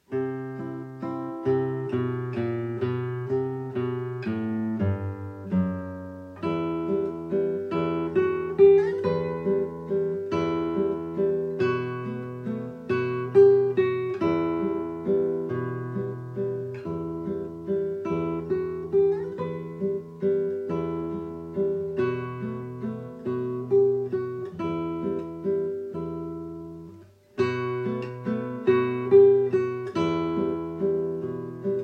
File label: Waltz; Calatayud